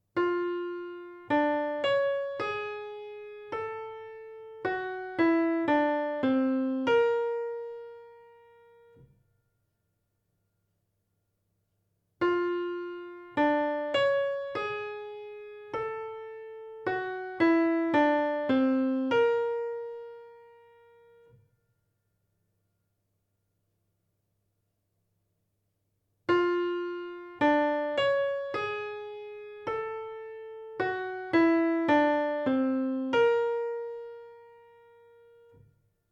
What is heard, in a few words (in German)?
atonal